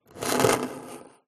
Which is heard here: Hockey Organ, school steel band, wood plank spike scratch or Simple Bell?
wood plank spike scratch